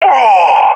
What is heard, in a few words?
balkan death; vo; balkan epic